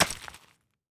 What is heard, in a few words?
crystal cart impact